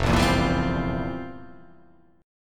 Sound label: F Major 7th Suspended 2nd Suspended 4th, chord; strummed; JGuitar